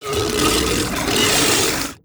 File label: swarm generic idle; Stellaris; other entities